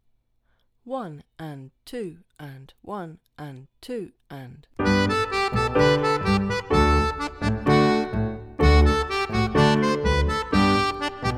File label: Milonga backing